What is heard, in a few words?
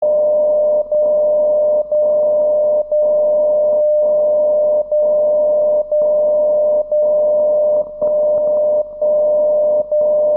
dcf77; 77.5Khz deutsche DCF77-Signale; CW